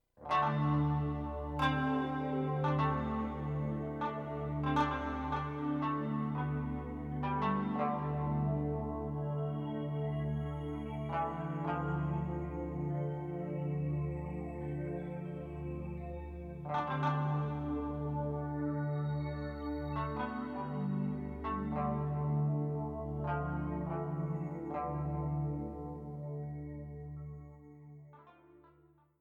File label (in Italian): orientale